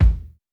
kick, house

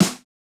pop snare; japanese pop

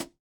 hihat, house

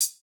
hihat, house

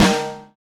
snare, snare house

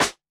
snare; funk

